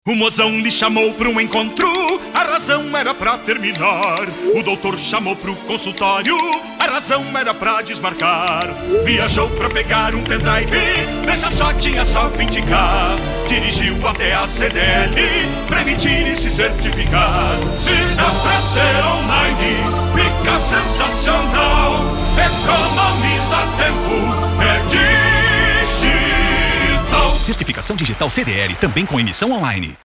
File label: Jingle; CertificadoDigital Distancia